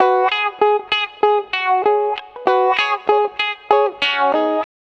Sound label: Electric Guitar